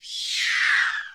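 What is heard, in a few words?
Pterodactyl Hatchling full shriek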